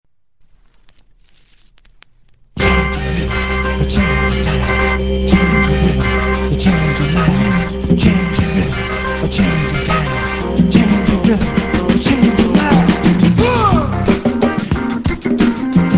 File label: rhythm changes